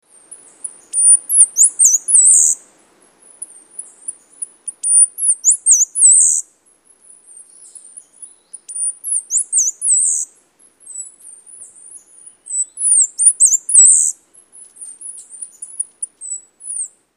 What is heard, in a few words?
Chestnut-capped Brush Finch